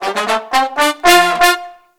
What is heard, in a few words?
Disco Fever, BRASS-LICKS